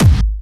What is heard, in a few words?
hard bass drumz